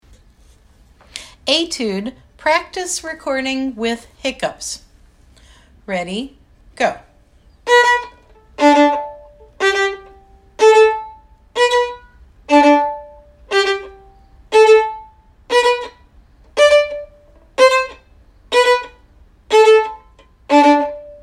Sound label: Etude with hiccups